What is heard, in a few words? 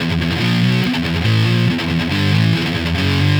Electric Guitar, Loops; Guitars